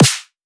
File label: Odyssey Dubstep Snare, C#; Cymatics